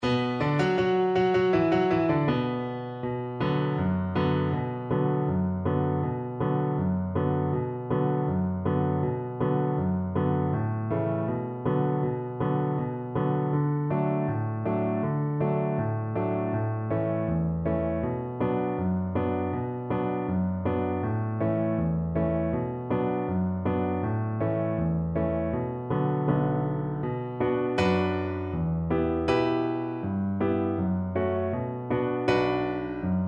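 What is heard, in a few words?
VLC karaoke, Cello; du shu lang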